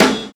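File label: PERCU RIDE; Univers Sons - Kitch 70s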